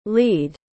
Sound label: Lead